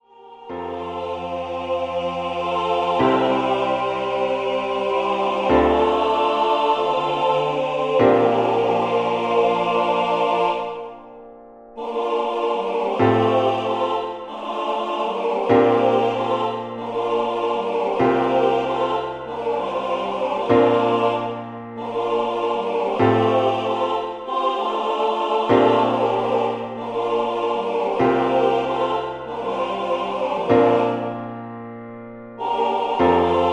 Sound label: MIDI SATB